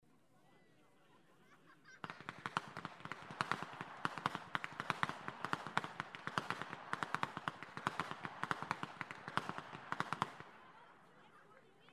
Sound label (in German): schnalzen, flachgau; LIEFERING I